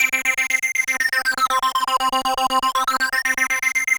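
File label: Kaossilator processed (120BPM); Korg; creative gate; musicradar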